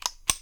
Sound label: on off, Switch FX; Masterbits